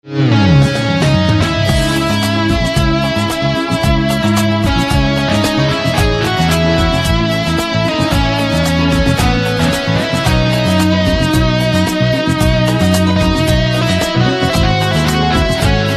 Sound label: Electric Guitar Instrumental Ringtone